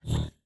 equip energy, weapons; cstrike; valorant; singurality phantom

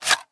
mag out, weapons; Valorant; phantom

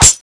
hit; Valorant; cstrike

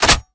Chg Hndl Fwd FP; Valorant; judge

